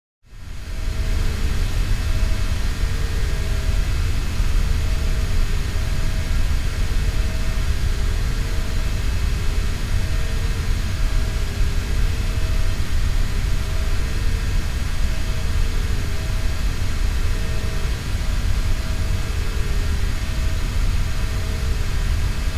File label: Space Ship Eng Rm Amb; spaceship fx